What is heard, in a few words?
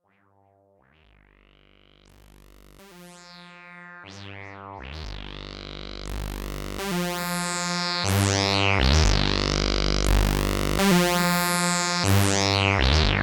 kobol lfo mod